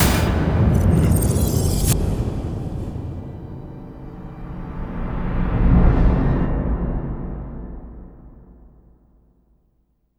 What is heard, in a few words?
GLASS ATTA; Distored Reality